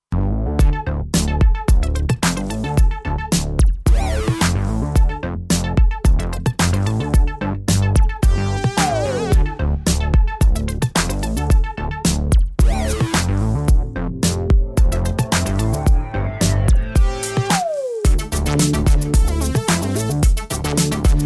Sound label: Sounds of Soul; Steinberg Absolute 5